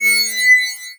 Multimedia Internet CD-Rom Flash Electronic

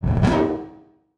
Multimedia Internet CD-Rom Flash Pop Up

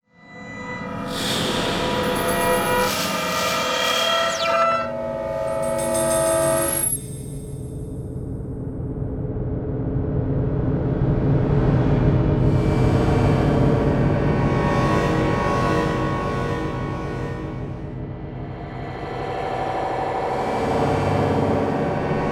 Electronic Whoosh Reverse Feedback Digital; Module Production Element Title Imaging; Imaging Elements

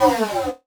Flash Whoosh; Multimedia Internet CD-Rom Flash

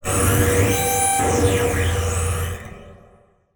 Title Transition Evolver Electrical; Production Element Title Transition

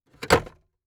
Transportation Chevy Trailblazer Close Glovebox

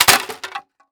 Impact Drop Plastic On Concrete Flat

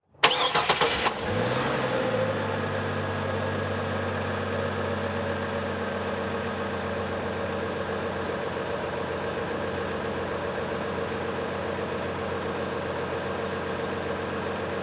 Truck GM Envoy Start Idle Off Mic Under Hood; transportation

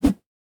Industry Aluminum Rod Whipping

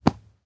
Household Hand Fluff Pillow Single

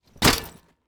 Sports Bag Duffle Bag Drop Metal Surface

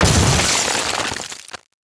Title Transition Glass Element; Production Element

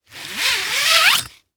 Weapon Machete Remove From Sheath; AdobeCC

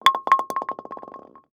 Sports Bowling Pin Fall On Cement Floor

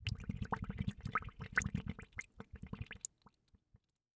Liquid Water Bubbling Lightly

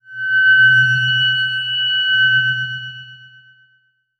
Transition Sweep Warble; Production Element

